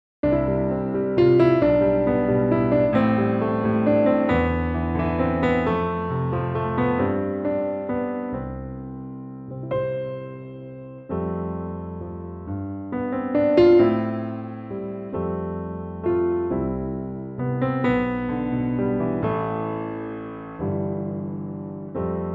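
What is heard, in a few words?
C piano track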